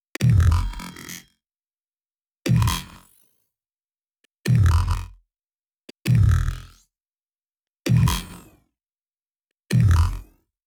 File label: Electro Jump